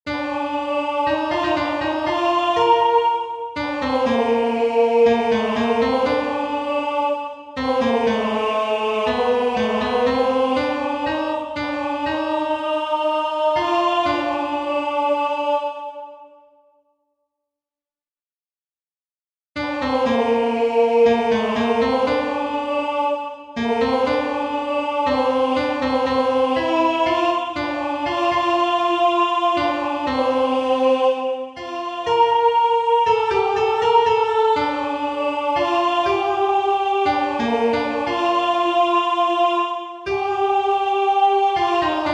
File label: alto